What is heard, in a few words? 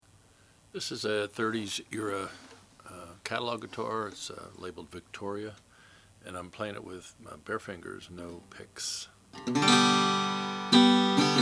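victoria standard size red oxblood, Guitars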